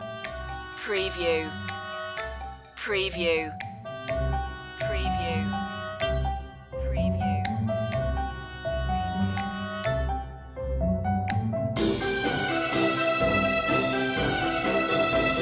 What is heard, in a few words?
a quirky flourish